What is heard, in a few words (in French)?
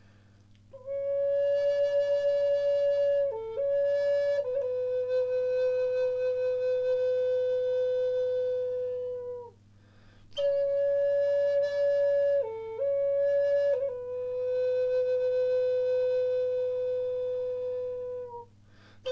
Sol en érable